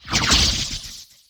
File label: Teleporter Nebula Cloud Enter; Evolve; Wraith